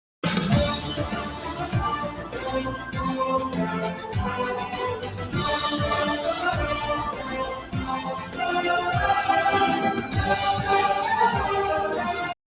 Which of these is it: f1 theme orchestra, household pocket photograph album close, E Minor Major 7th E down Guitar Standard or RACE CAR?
f1 theme orchestra